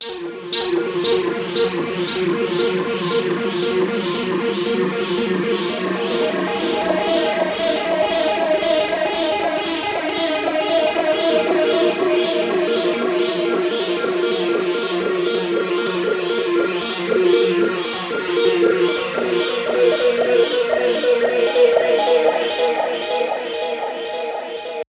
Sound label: Melodics; neuro; Optiv & BTK